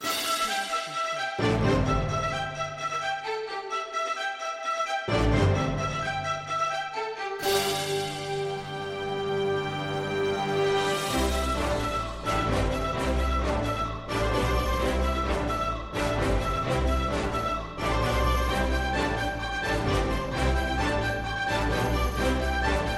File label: westward bpm 130; soundscrate